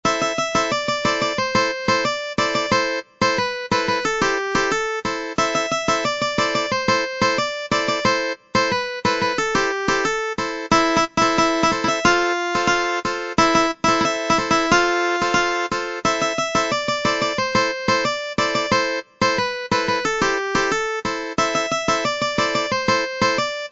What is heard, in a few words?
Guitar